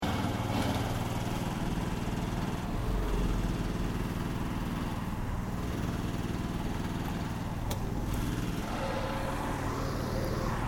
MACHINE HAND PUSHED MECHANICAL ROLLER OPERATING LOOP, Road roller